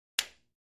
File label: Free Weights Exerci